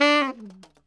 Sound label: TNR SHFL D4, saxophones, SHORT FALLS; Greytsounds - Philip Wolfe David Crozier's Saxophones